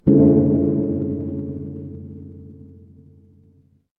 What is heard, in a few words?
instrument gong